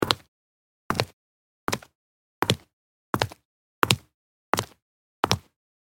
High heels walking on street

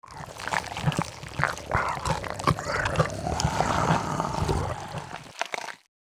Dragon eating flesh

